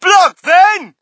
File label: crowd, shout; Mike Tyson Heavyweight Boxing